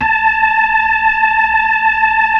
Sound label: B-3, Keys of the 60s and 70s